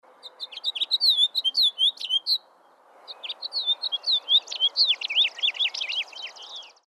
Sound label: house finch; calls; Audubon